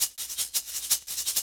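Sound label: drum and bass Shakerloop; Dance DJ; Percussion; Shakers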